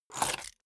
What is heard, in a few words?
key ring large grab table hard